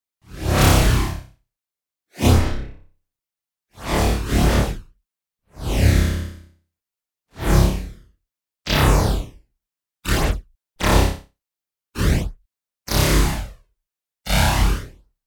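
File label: lightsaber swinging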